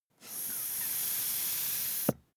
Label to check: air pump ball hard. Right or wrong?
Right